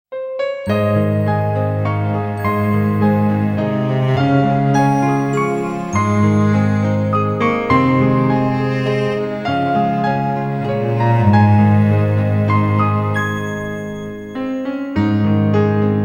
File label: Evening Angels Lullaby